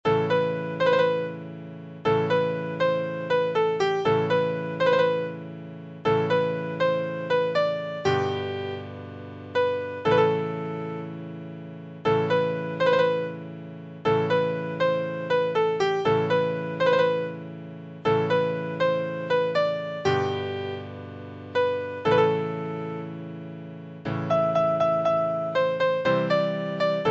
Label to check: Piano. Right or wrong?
Right